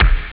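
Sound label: bass drum; Amiga Soundtracker sample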